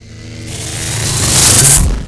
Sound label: Cosh Charge Start, Electric Cosh